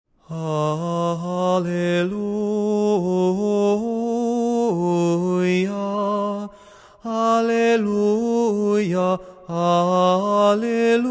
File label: Tone 6 Alleluia